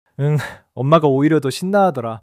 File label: laughter